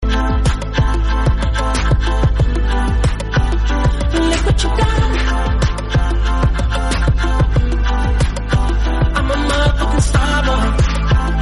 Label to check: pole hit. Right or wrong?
Wrong